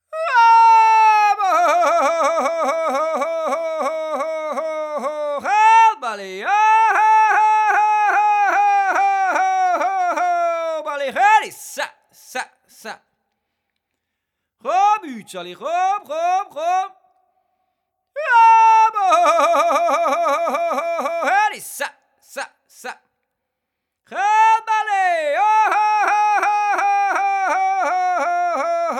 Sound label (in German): Chuäreihäli (Vieh-Lockruf)